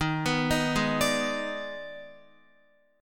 Eb Major 7th Suspended 4th Sharp 5th, Guitar, EbM7sus4#5 chord; arpeggiated; x,6,9,8,8,9